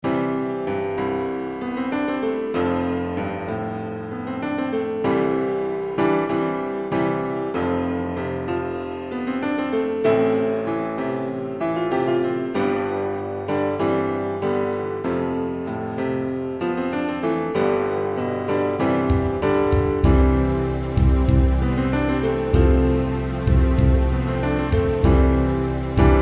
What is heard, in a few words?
AMBIANCE Piano Marching; Soundfont